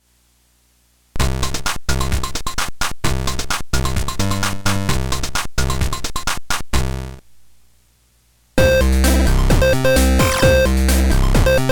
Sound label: gba sp2 min light